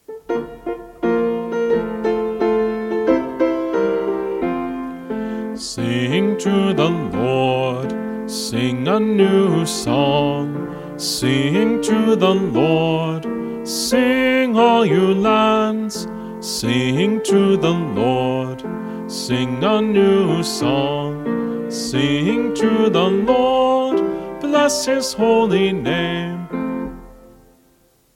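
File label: Jingle Bells Psalm All Parts, Choir Practice Tracks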